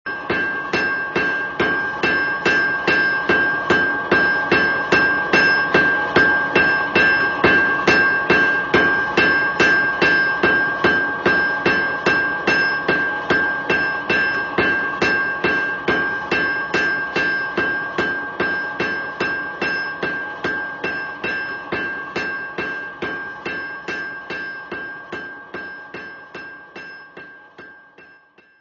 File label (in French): battement fin